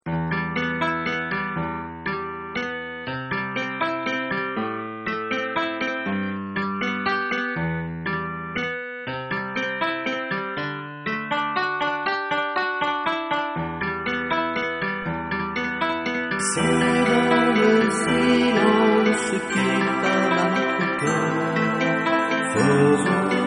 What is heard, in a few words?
silence